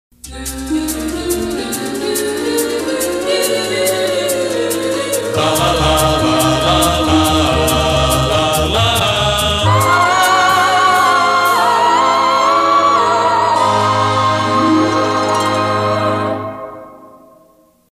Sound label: Go Go Church; WTIX; PAMS